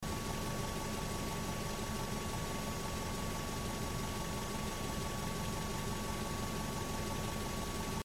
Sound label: Engine Idle